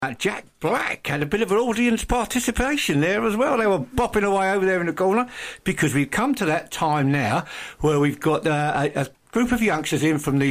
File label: Primary School Choir